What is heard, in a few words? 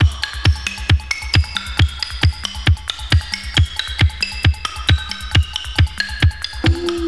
135 bpm vinyl record drum loop e key